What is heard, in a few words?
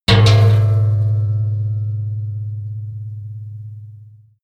impact metal deep long; maschinen; soundpool